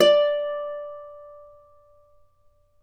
Celtic Harp, Plucked Strings

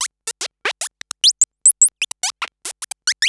Droids

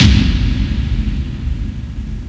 Boom; Urban Shakedown

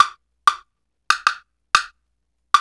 BRKDR, Reggae; Spectrasonics; Supreme Beats; World Dance

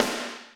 SD, Drums; Bob Clearmountain Drums I

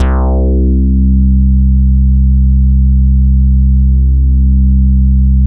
BAS C2, 2 Step; USB Soundscan vol.51; House Side Of 2 Step; AKAI; BASS

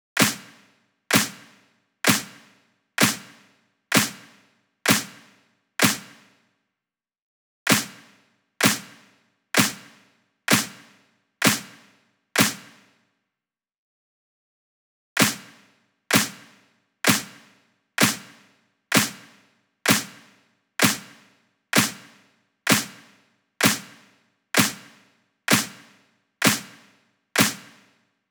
128 BPM Clap; Vengeance - Essential Clubsounds Vol.5; Old College Kit Root G; Drums & Perc

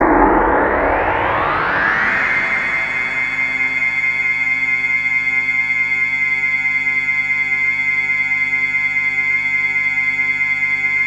EFFECT, Explosive Jungle; USB Soundscan vol.05; AKAI

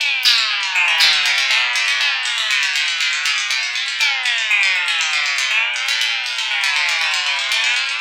Structured Rhythm, IDM Rhythms; Sonic Foundry (Sony Creative Software); Twine-IDM

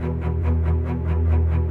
Bass Cello, Orchestral; Sonic Foundry (Sony Creative Software); Orchestral VOL-2; Modern 13

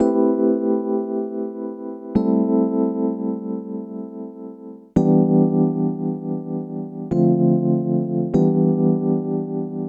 Keyboard

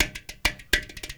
India, Heart of Asia

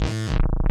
technosyn2, Techno Trance; Ueberschall; SYNTH

